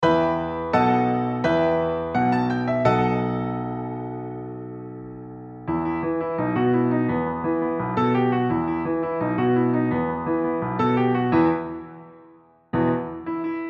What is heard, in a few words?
solo, piano; Nayagan